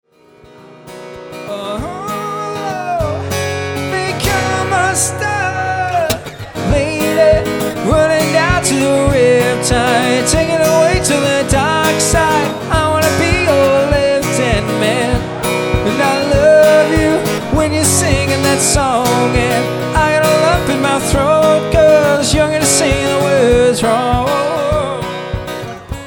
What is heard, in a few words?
Live Acoustic